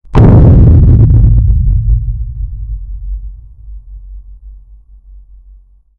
dark attack; horror

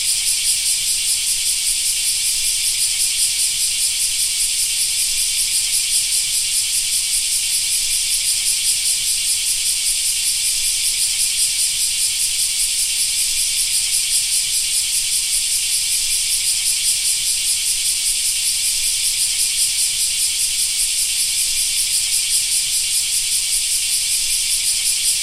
black cicadas

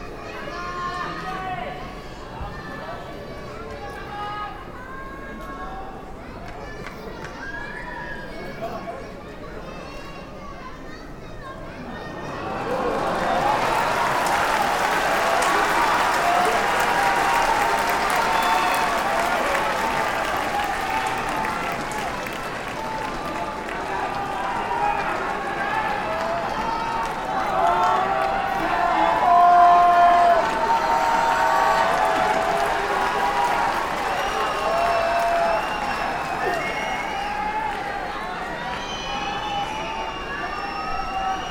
Yokozuna fight

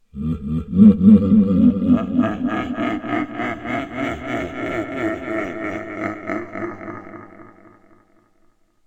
devil laughing; monster

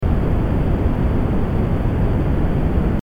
bg white noise

